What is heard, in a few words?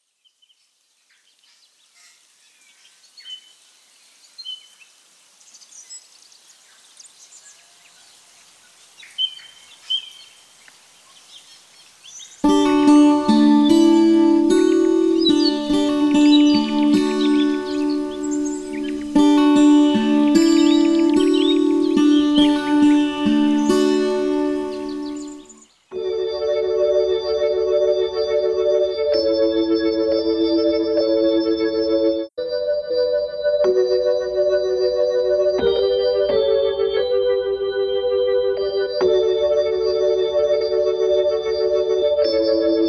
ambiance organ; smorgasbord